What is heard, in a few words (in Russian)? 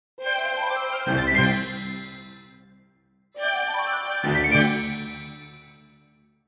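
свежая переходная музыка по случаю награждения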